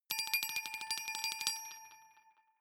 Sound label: glockenspiel sparkle tremolo low short